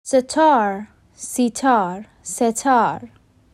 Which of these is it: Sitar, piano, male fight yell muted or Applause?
Sitar